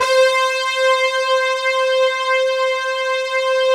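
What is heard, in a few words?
synth brass c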